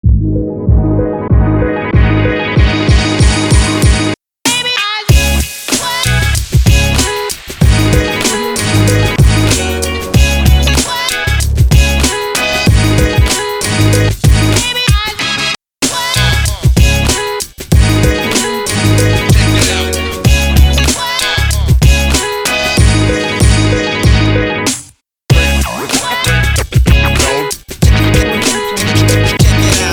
hip hop

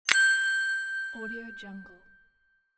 short button

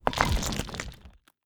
wall crumbling rock stone debris; empire game settlement ui

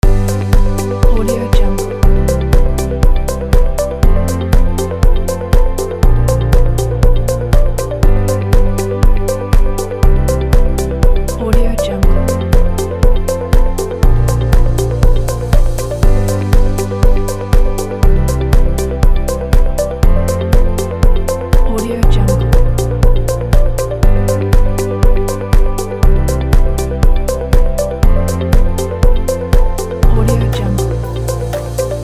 corporate loop

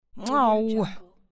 disappointed tut

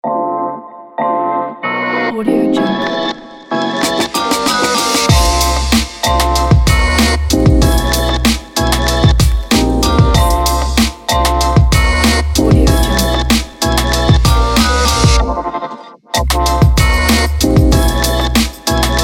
hip hop chill

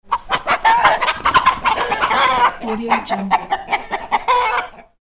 chicken

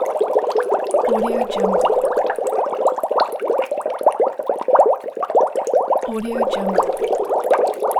water bubbles; soundjay

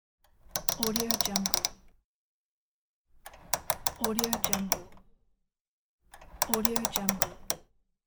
spinning the toast timer machine button

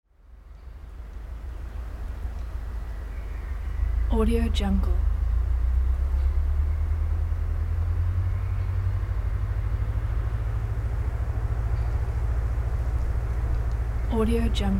tractor working behind the forest

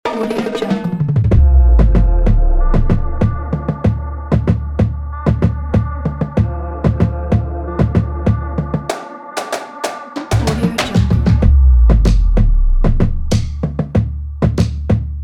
modern commercial logo

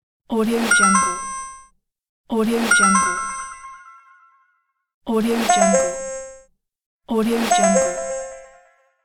notification sound

